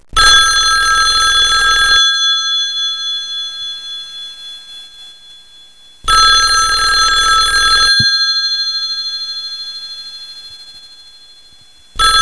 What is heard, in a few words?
PHONE